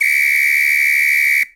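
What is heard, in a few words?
Samba Whistle; Latin Percussion; Drums; Drum Hits; Ableton; Factory Packs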